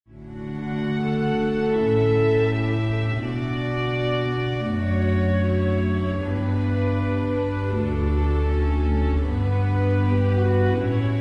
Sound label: Canon In D